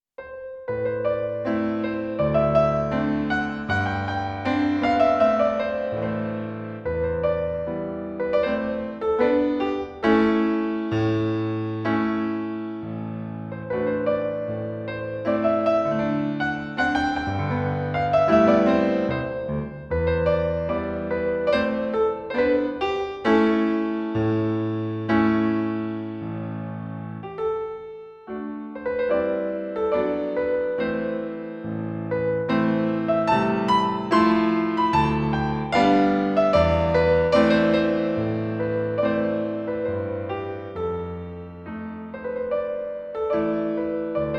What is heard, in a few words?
Romanian Folk Dances Stick Dance; Bartok